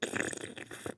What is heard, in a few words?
glue slurp